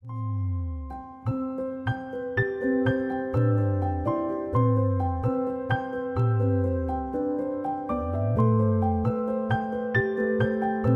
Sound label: google pixel alarm ringtone